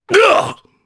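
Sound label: Jump, Japanese; voices; heroes; Ricardo; kingsraid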